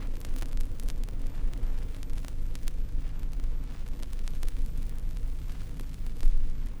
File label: Crackle, Vinyl Noise